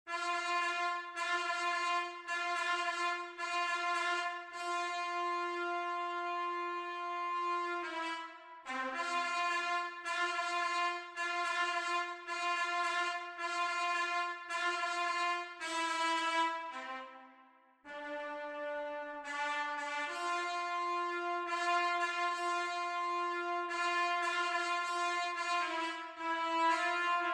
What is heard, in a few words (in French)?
Trompette en Sib; Vive le vent